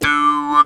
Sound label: Talk Box high